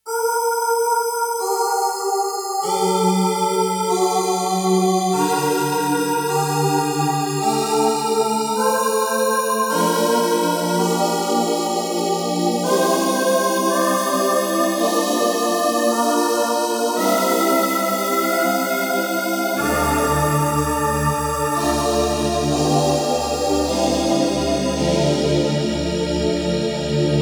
SYN VOX, Roland JX-1; Factory presets

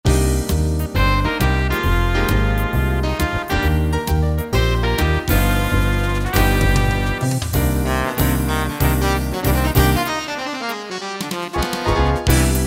Jazz, Roland EM-10

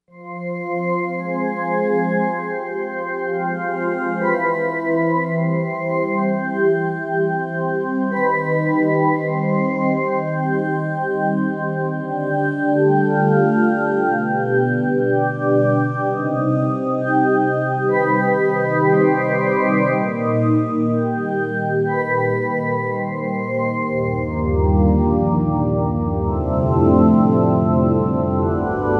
Bellsynth, Korg M1Rex; Factory Patches

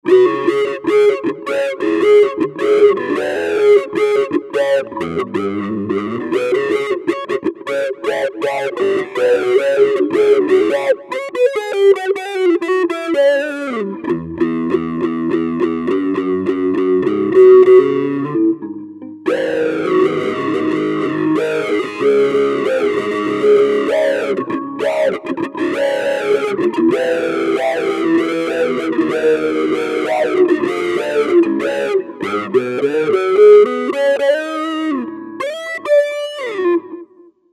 TALKING CRY

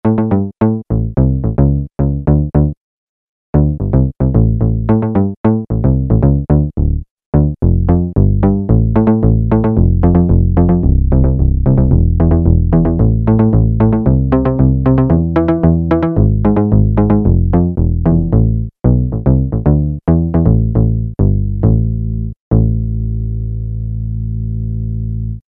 Mini Attack, Peavey DPM Spectrum Bass

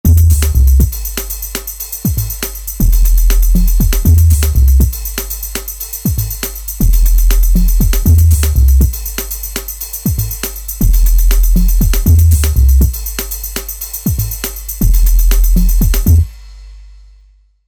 Drum Bass

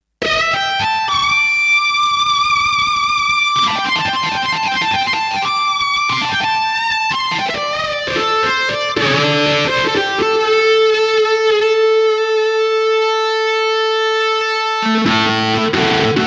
Lead Gtar, Kawai K5000S factory presets

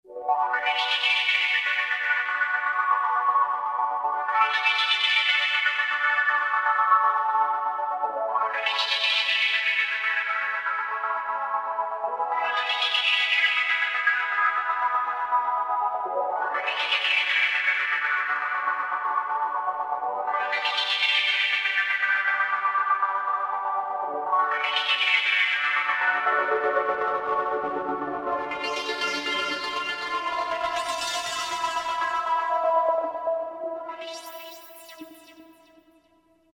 Pad, Novation XioSynth 25

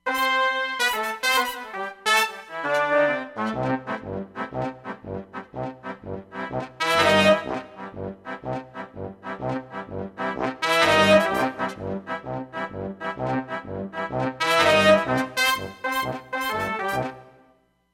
Wonder Brass, Roland Fantom XR

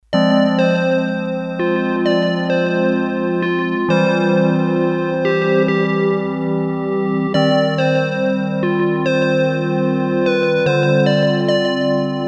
Korg DS-8 Sqr Wave Pd; Factory Patches

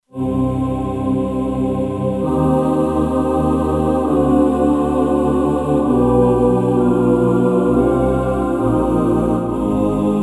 The Choir, Kurzweil K2500X; Factory Programs

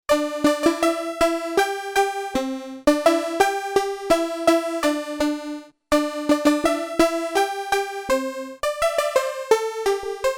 SYNTH KOTO, Korg DW-8000; Factory Patches

